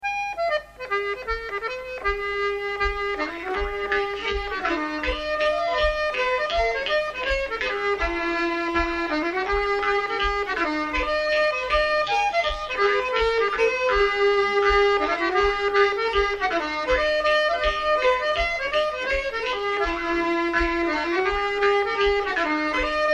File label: schottische